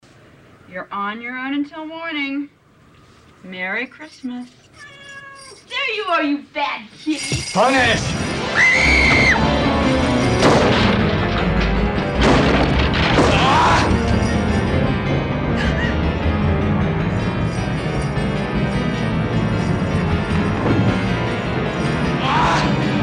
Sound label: Horns